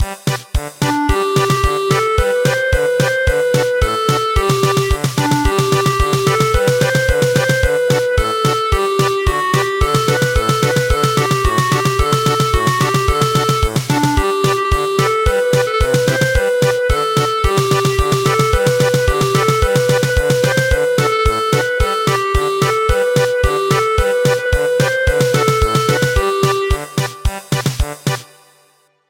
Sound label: German Polka; The dance of barley